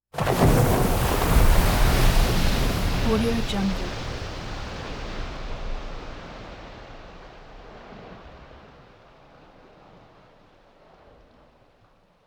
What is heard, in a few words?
wave spraying; prosoundfx